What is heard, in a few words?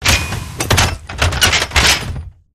rocket reload; silverback